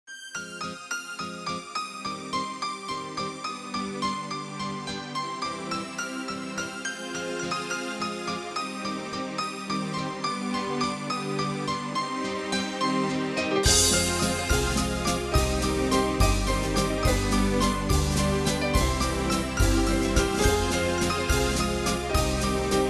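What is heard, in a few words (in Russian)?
вальс новогодний рингтон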